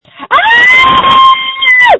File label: Scream